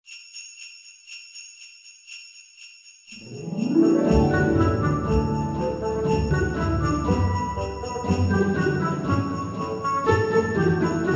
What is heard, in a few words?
traditional jingle bells ringtone